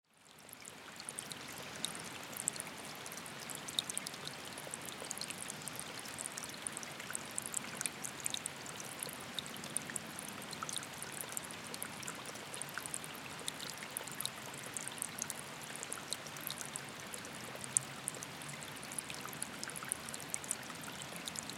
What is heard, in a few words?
water in the forest; alishan